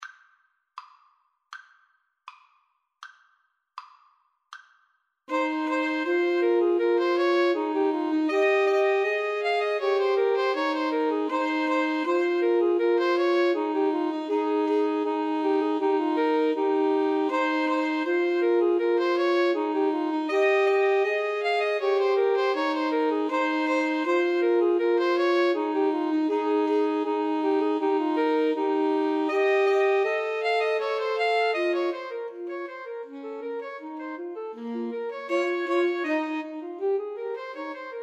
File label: Bach Gavotte Cello Suite 6 SAX